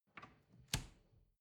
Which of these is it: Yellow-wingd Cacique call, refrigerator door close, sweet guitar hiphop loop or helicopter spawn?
refrigerator door close